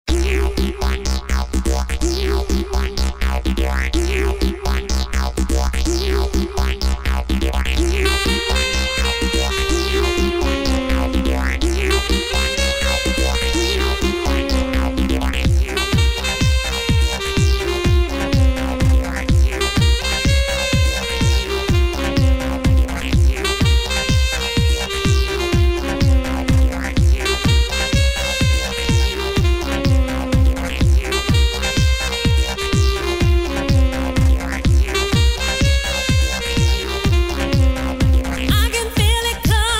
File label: saxy dance song